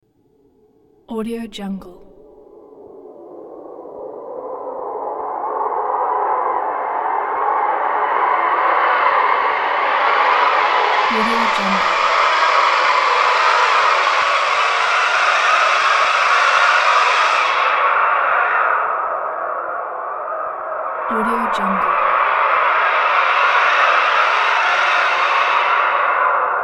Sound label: vortex with sweep